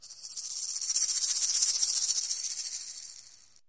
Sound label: maracas, shaker